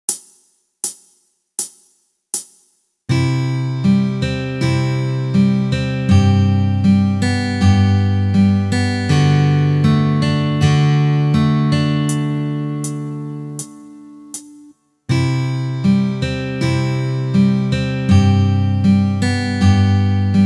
Ballad

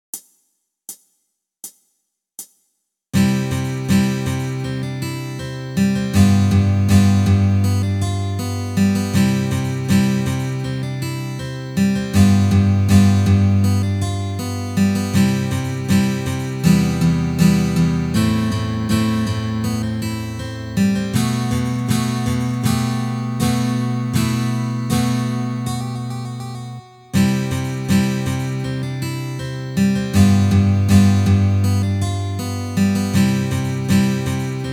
Sweet Melody, guitar

